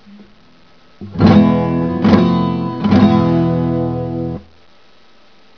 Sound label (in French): RASGUADO, guitare